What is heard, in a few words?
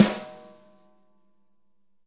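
snare A3, multisampled real kit; REAL LIVE KITS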